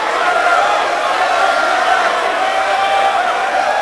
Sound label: Small Crowd Unrest; David Beckham Soccer; XBOX